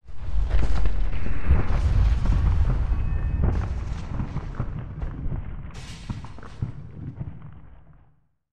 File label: CRUMBLE DESTRUCTION, earthquakes